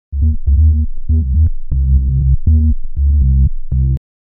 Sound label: deep and groovy bassline, disco